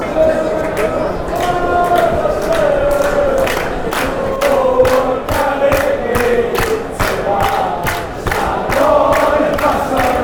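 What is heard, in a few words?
cheer up, football songs and soccer chants; walter smith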